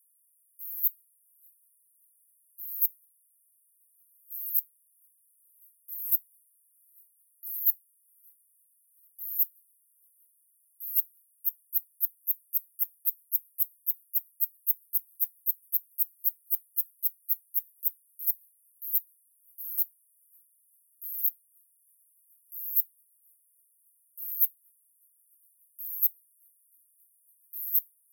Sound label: C sibillae original frequency; Pontremoli